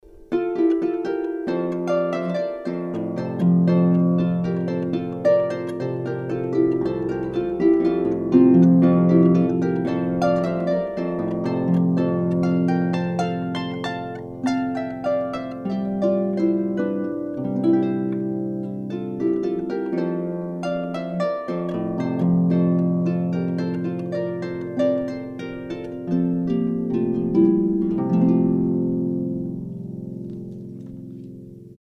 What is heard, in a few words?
Gavotte